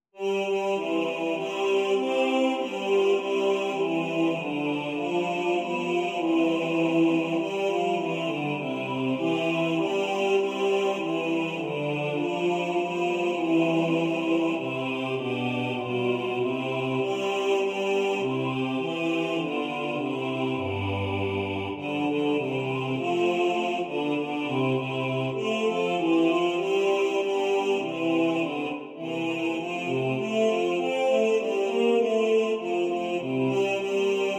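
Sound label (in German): Bass1 im Vordergrund, Männerchor; Gegrüßt seist du Maria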